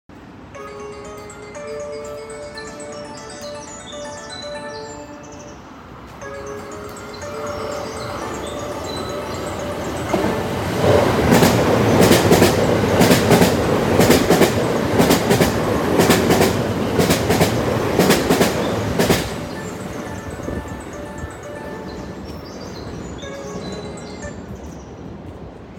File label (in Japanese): 大阪環状線通過、野田駅で録音